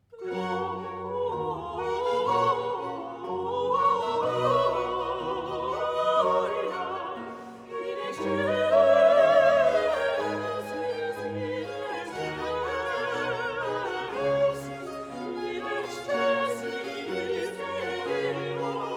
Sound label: Mass in G Major BWV 236 Gloria; Bach